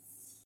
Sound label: wool; Pokemon-Off-White-unity